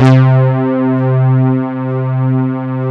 SYNTBRAS C3, Synth

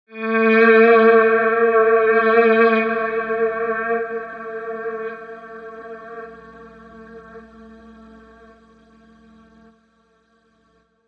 Guitar Notes A; Zero-G - Future Wave

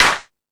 Hip House, drum machine

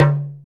PER Percussion

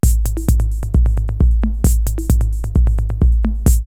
Echo Room 132, drumloops, Techno&House; X-Static Goldmine

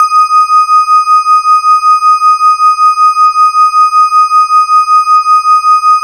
E-HARP, DX HARP; Wizoo - Powered DX

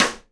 SIDE STICK, Live Snares

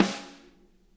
SNARE, Interactive Drum Kits; Sonic Reality

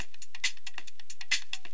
LOOP FX, Big Beat Drumloops

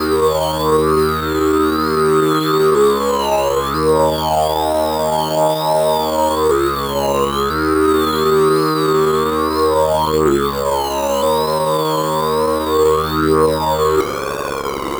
Electro Vox, EDM; Sonic Foundry (Sony Creative Software); Afterhours EDM; Retro Fit

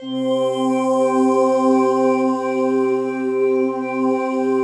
pad, 200 BPM, Drum & Bass; Ueberschall; Drum & Bass Resonance

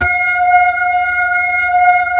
B-3, Blues

